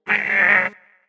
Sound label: Disgusted; jibo-cli; behavior-template